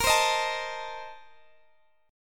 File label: A sharp Major 7th Suspended 2nd Suspended 4th A sharp Guitar DADGAD; strummed